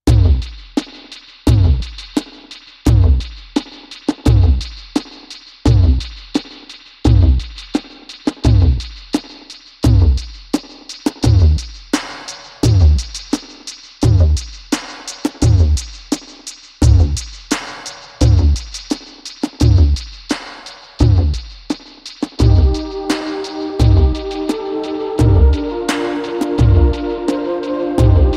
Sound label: heartbeat